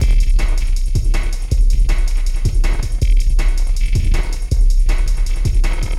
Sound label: Noise Beat; Drums & Drones